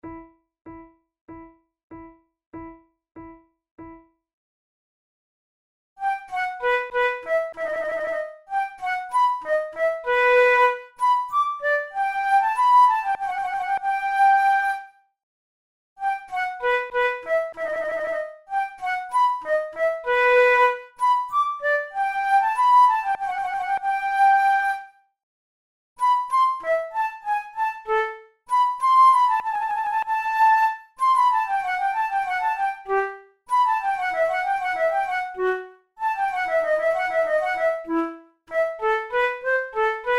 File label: allemande, flute